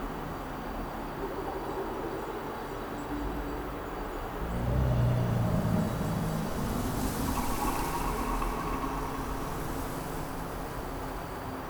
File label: Amb Boss; bloom minigame